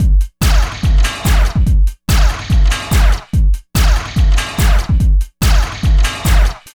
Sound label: LOOP, Tekno Industrial; East West